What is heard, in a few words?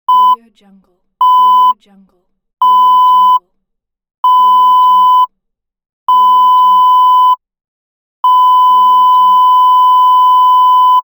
censoring beeps; rm-sound